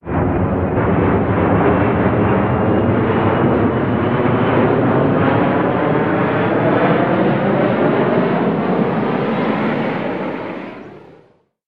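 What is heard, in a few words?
airplane takeoff; звуки двигателя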